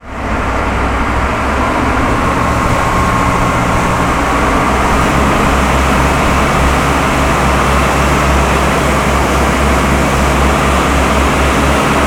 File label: boat onboard run stop; best service Blue Box; sea traffic